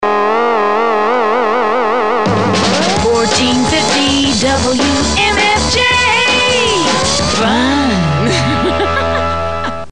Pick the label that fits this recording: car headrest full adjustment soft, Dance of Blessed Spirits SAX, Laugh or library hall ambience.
Laugh